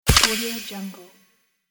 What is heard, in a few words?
bright unlock